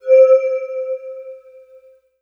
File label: Yamaha TG77 Wine Glass C5